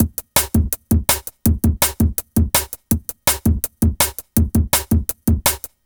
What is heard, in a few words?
165 break, Explosive Jungle